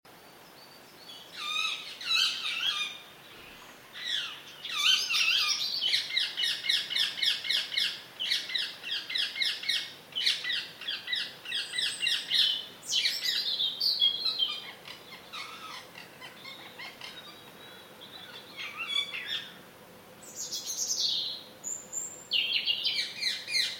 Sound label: Sunday morning birdsong starting with shouty parakeets